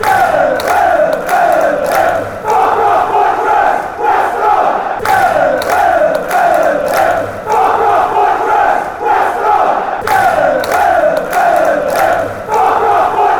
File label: west brom chant